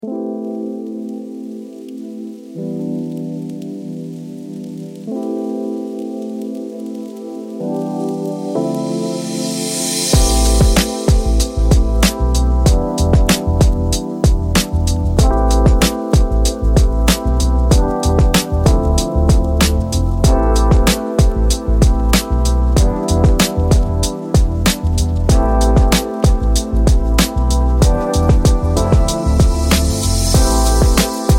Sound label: Rhythms of Rain